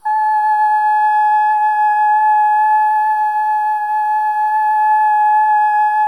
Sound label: SOLO BOY, OH; Spectrasonics; Symphony of Voices